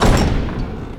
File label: Snare; 音MAD; 转生成为了只有乙女游戏破灭Flag的邪恶大小姐